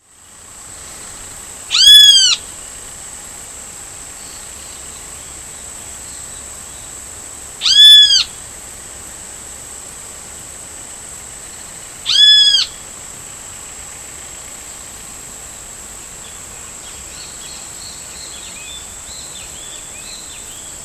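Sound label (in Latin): Pitangus sulphuratus, Uruguay; Silveira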